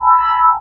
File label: med tone; Dystopia; implants